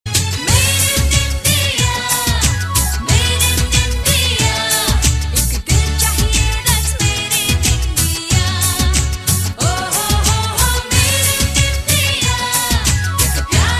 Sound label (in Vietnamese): nhac an do